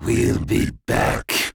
voice death; grimson

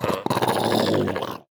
growl; httyd

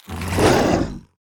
werewolf transformation; halloween

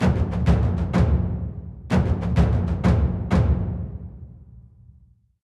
ambience war drums

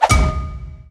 gem attack; mactans

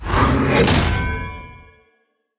heroes celestial Judgement impact; lumenir

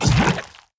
enemy acid evolved attack

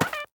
arborean Tap in; sfx

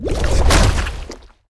heroes creeping Death cast; grimson

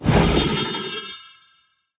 celestial judgement cast; heroes lumenir

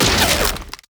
spiders tower cristalize bolt

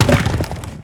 trunk hit floor repeat; crocs

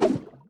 basic Attack cast; battleBrewmasters

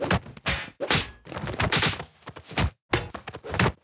combat melee attack no sword loop

